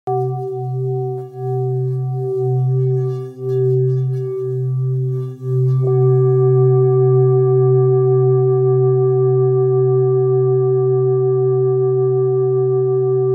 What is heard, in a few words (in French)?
Bol bengale do 1.635kg